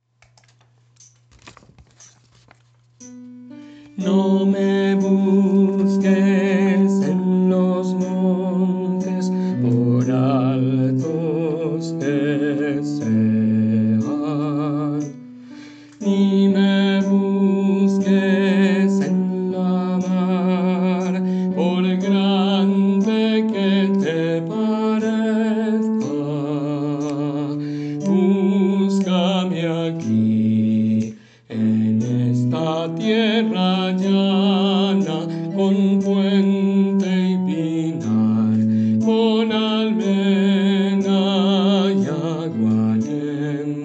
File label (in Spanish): Bajos; Miguel Hernández